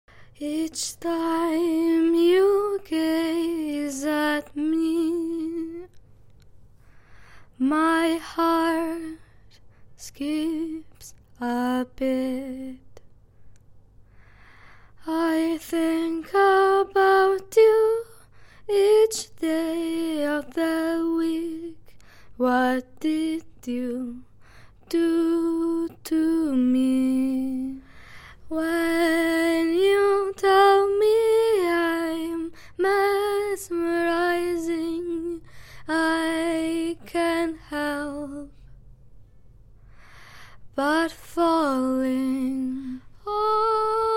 acapella, Pop 96 CM

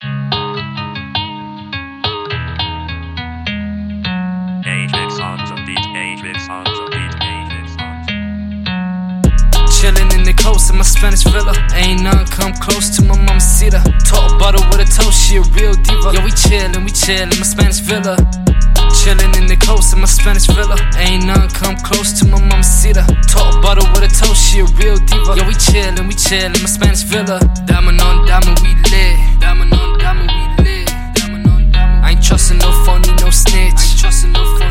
Remix

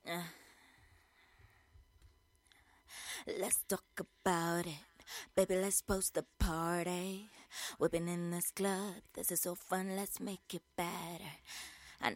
pop rnb african FM 110, acapella

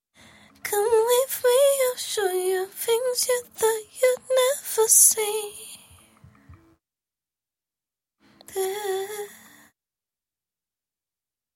pop sing female 82 AM, acapella

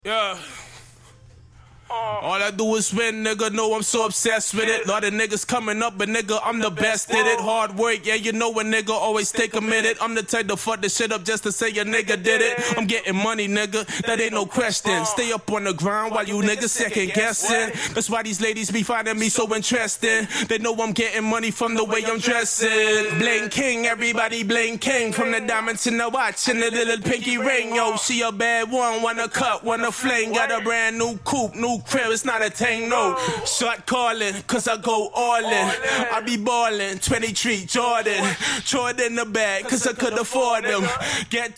hip hop 186, acapella

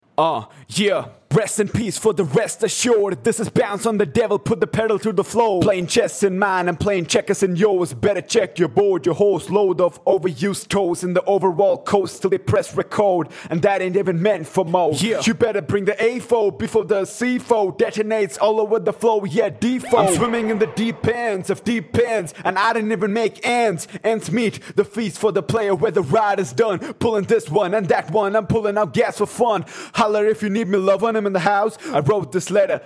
acapella, HH 101 Fm

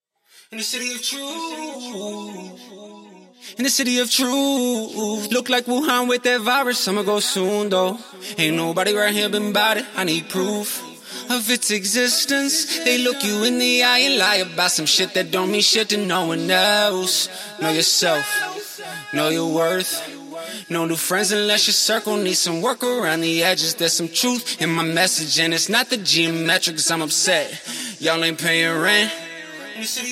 Acapella, 162BPM Key B

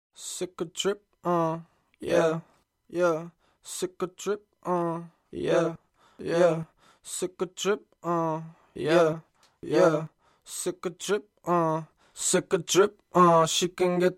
70BPM Key Am, acapella

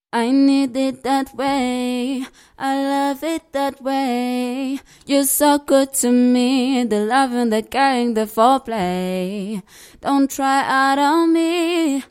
pop RB 95 Cm, acapella

